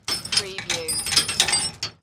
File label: Chain Metal Clanging